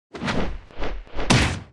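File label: attack; hero sound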